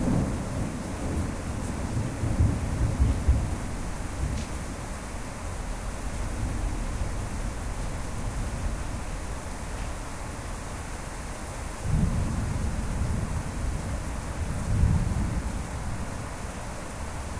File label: weather storm; Pixture; pixture revival; rp weather